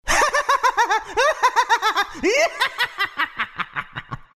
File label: laugh, zh; Dota 2